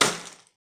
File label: hit; breaking falling hit sfx